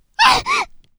hau

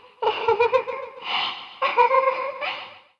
ufuufu

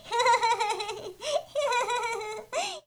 warai itazura; 女; 笑い５ フフヒュフフ （子供）; いたずらっ子っぽい笑い

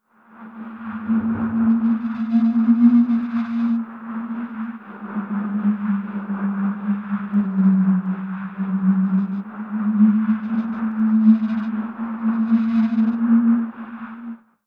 hyuuuu

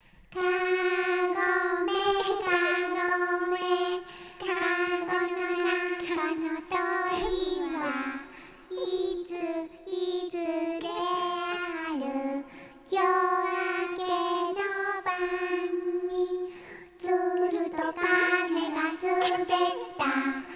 kagome; かごめかごめ 複数版; かごめかごめ 楽しそうにあそぶ子供達

